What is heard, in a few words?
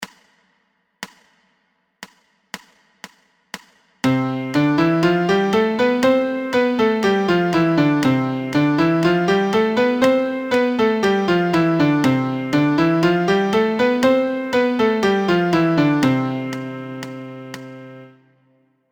MIDI Major Scale C 120; C Maj Scale 1 8va 120; Piano Practice Tracks